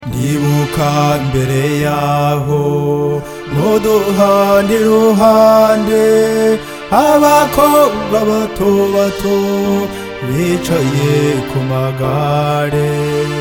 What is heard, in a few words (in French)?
Chant rwandais